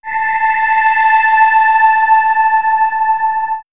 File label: Swirls, pads; EyeQuest; X-Static Goldmine